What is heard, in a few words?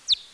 bechstein, bats